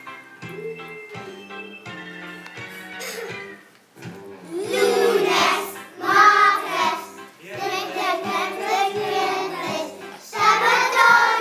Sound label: singing days of the week in spanish; year 1